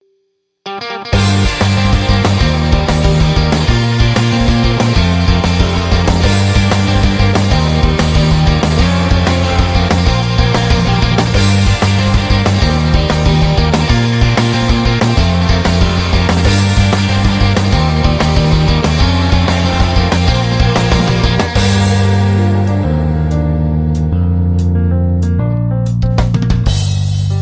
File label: drums